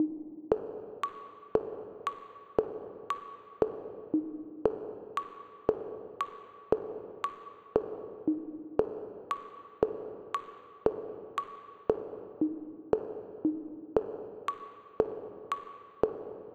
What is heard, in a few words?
drums